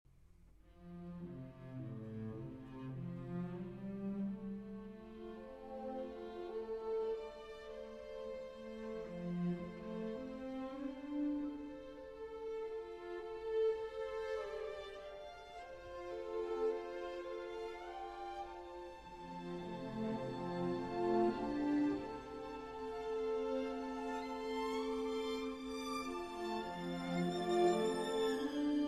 Double Concerto For Violin, Piano, and String Orchestra with Percussion